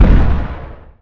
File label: artillery distant